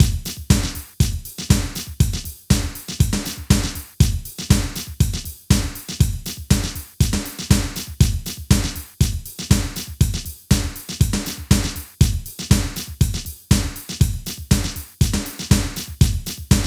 TECHNO; INIS; Funky Xtreams

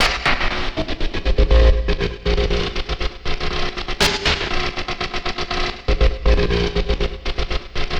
Envelope Beat; Drums & Drones

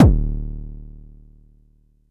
Noisy bd, Jomox Airbase99

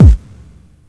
KIK DIST; E-MU Formula 4000 Series Vol. 1; Hip Hop Nation; Hip Hop Kit 2

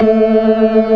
ORGAN, NO PERC

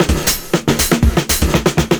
FILLS, 70_s Breakbeats; USB Soundscan vol.46; AKAI

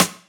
Rimshot; Equipped Music; Loungin House

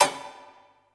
Misc Stab

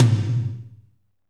TOM, Kit Dry; Northstar; Drumscapes Roland

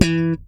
SLP POP E, PEDULLA BASS; Masterbits CLIMAX 7 - Bass

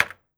KEY, SWITCHES; AKAI Anime Sound FX

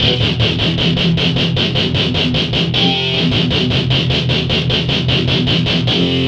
Metal Guitar, Guitar Synths; Dr. Fink's Funk Factory

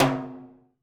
Lo W Verb, Alesis D4

